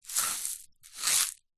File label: salt rock in plastic shaker tip movement